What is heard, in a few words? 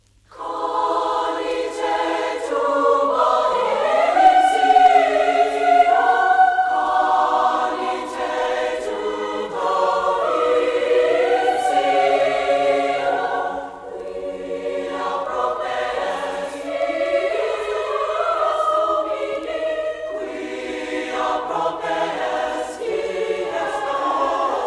Tuba; Canite Tuba